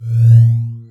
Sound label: tesla charge; halloween